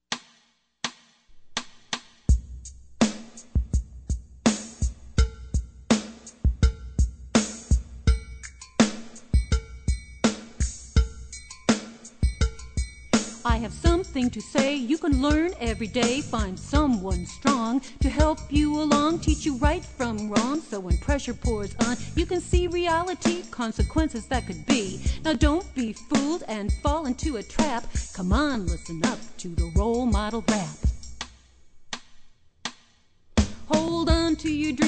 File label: Role Model Rap